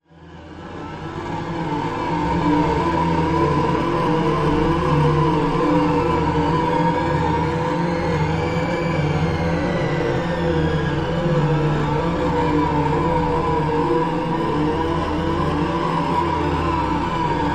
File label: Altercation No Drums